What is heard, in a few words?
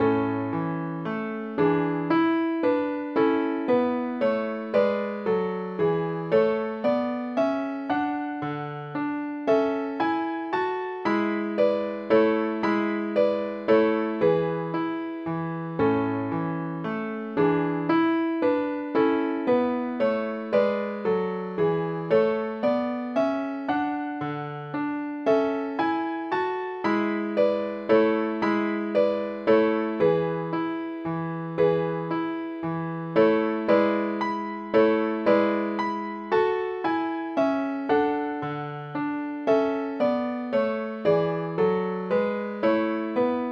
Menuet al Rovescio